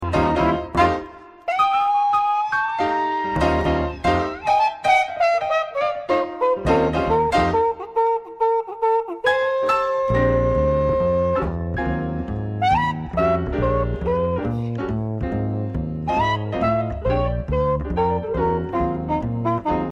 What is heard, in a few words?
B Sharp Ringtone